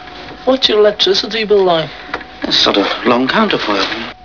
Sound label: electricity